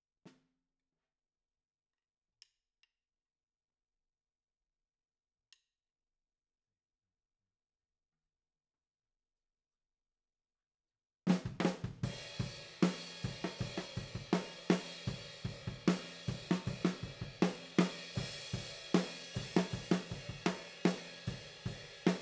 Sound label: OH A